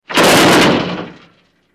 Large Crash Metallic

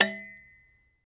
Bullet Shell Copper, shell casings fall

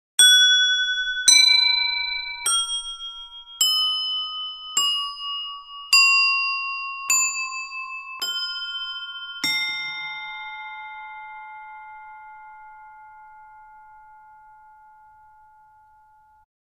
Clock Tower 19th C Chi, bell towers

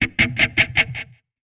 Music Muted E Guitar

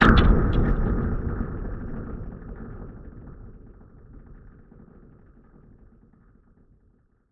Deep Impact Delay

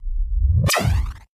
ships laser discharge, lasers

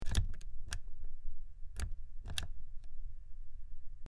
Large Button Press In, switch clicks

